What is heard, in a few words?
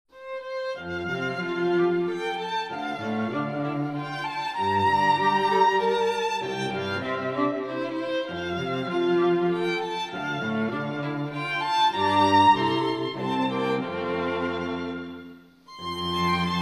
Instrumental; Alleluia, Alleluia, Give Thanks to the Risen Lord